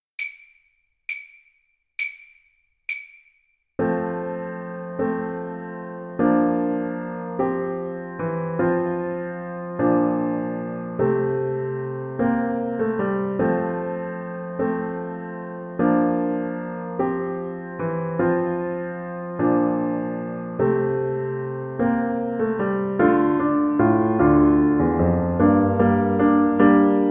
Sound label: ukulele